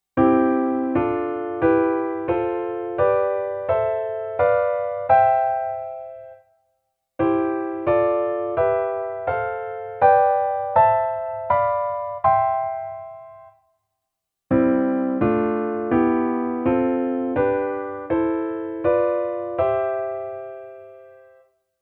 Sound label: scale tone chords and inversions